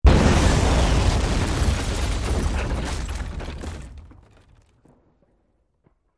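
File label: Explosion Wood Debris; wood & sand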